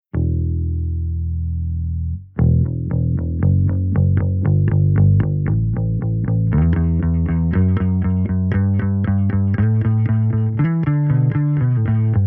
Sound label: SVT HIGH